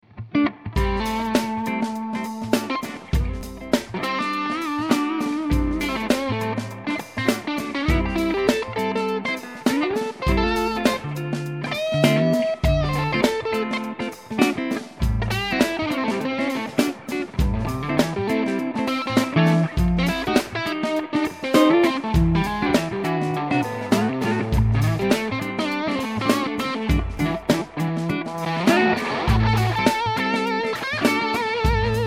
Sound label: Les Paul Blues Overdrive pedal